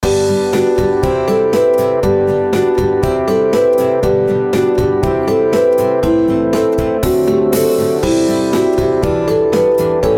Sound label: jingle